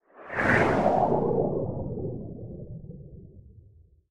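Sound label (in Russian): медленно пролетает мимо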